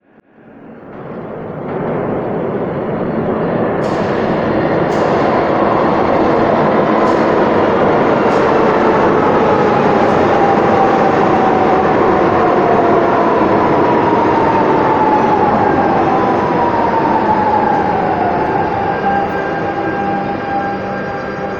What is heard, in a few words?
train arrive out